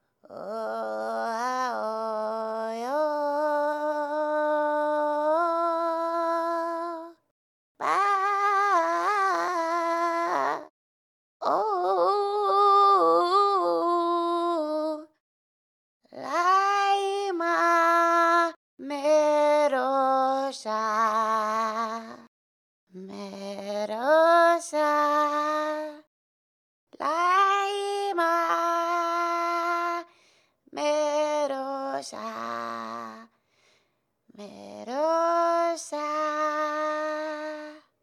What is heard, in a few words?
sing; Pilgrim merchant